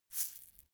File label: shaker plastic egg hit single soft